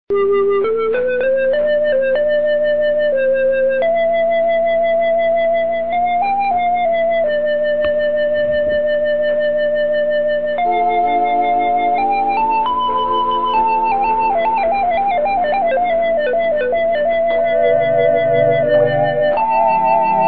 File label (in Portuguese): orgao